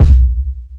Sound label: tight steel kick drum sound a sharp key